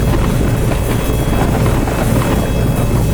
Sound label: vicious strikes loop; olaf